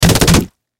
Bullet Impact Human, Impact Bullet Hits Human Fire Multiple Body; Machine Gun Fire; Warfare